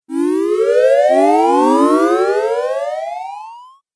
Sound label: Magic Fx Video Game